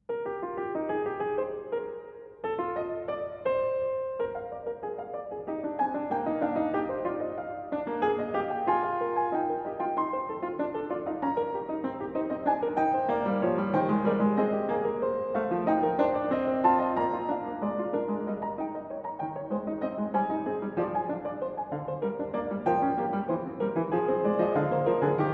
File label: Fugue in E-Flat Major; Prelude & Fugue in E-Flat Major, BWV 852